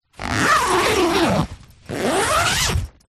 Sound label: tent zipper; foley; zapsplat